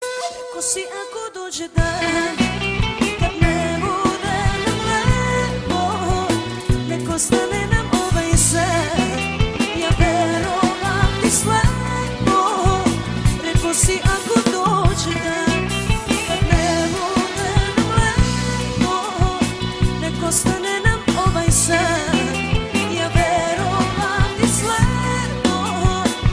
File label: allegro band